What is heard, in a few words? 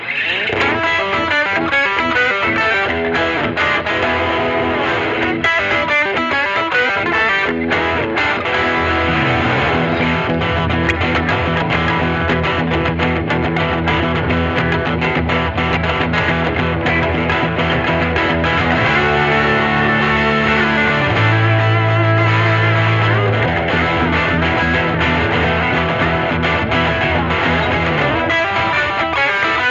Electric Redneck, No Drums